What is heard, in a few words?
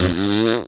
Human Male Fart With Mouth